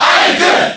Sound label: Cheer Japanese; Ike; SSBB